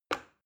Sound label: plastic button start release heavy